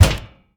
bang

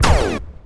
Laser

